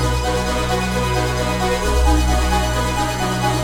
the cave music lilypad

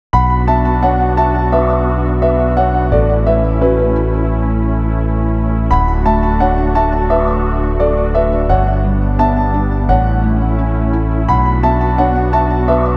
Theme (Sad); Persona 2 Innocent Sin; Ginko

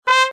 Trumpet c Do

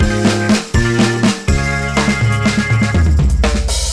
Transition; Hamsterdam

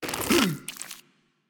Boss Presidente Hit

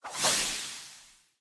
Results Zombie Transition To Icon

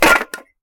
pot smash; Scrat

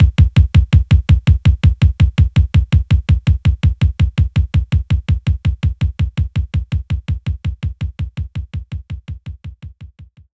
Standard Kick

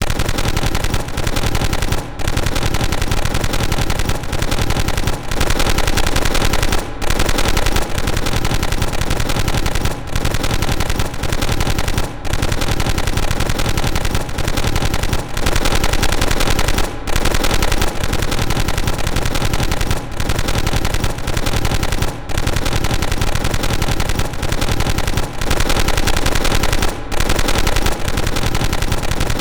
Gun

